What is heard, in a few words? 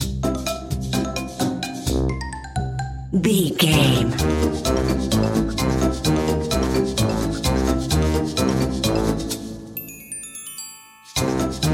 wacky inventor bumper